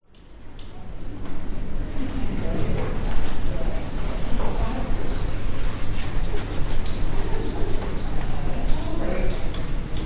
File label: public places library large city quiet amb brisbane